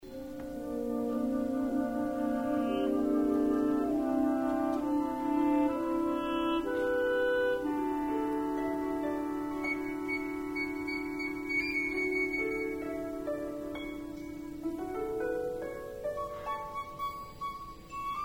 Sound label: kiss